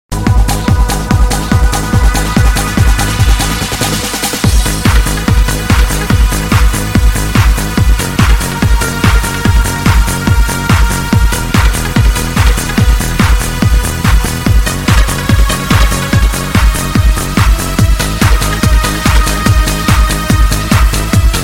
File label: trance